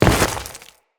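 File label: footstep on ice, enemies; Footsteps; Grineer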